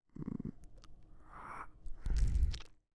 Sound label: Breathing Licking